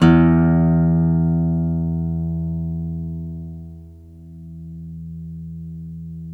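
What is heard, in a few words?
GTR E2 AC; Synclavier Sampler Library; KEYS AND GUITARS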